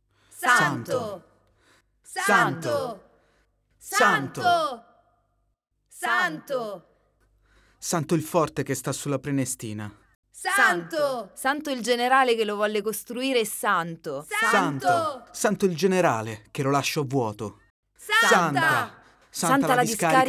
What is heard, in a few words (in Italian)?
solo voce